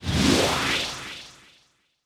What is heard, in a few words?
symbol Drop; netent